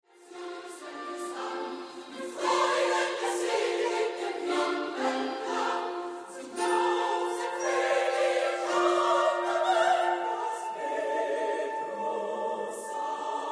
Symphony in D minor, Lustig im Tempo und Keck im Ausdruck